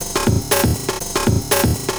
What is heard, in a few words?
drum loops; bb drum loops